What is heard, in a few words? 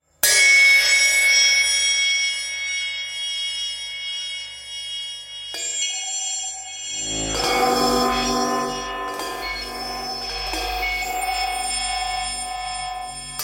hihat computer hammer